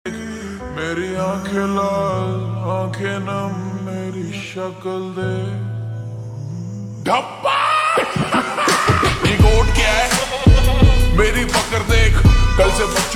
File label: Slow Reverb Version Ringtone